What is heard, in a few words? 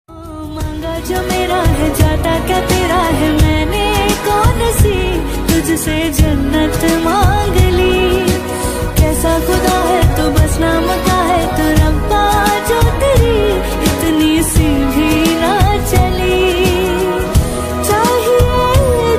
New Sad Female Ringtone